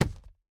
錾制书架 插入声

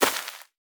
缠根泥土踩踏声

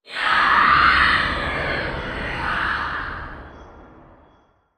幽匿尖啸体尖啸声

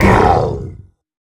劫掠兽受伤声

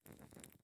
蜡烛环境声; ambient

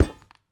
宝库踩踏声

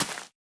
竹笋放置声

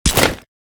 狼甲开裂声

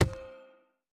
錾制书架插入附魔书声

